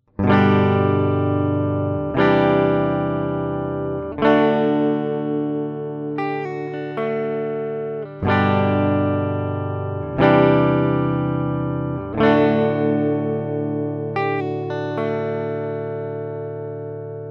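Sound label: UA710 guitar G12; Universal Audio 710 Testbericht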